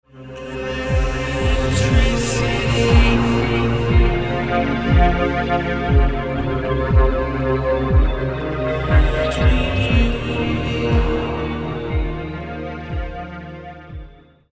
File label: Pipe Choir